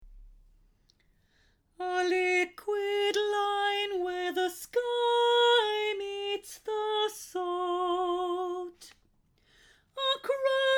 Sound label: Tutti Verse